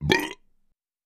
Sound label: Belch Deep